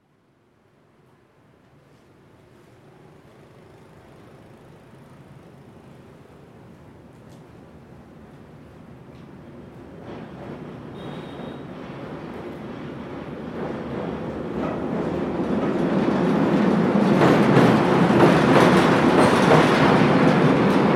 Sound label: a train on Long Bien bridge Hanoi Vietnam